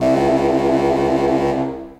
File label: Contra Bassoon Solo Half Step Trills, Orchestral Woodwinds; Ableton